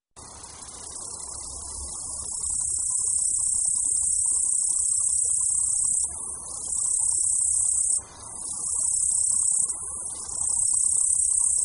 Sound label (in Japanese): yabukiri; ヤブキリ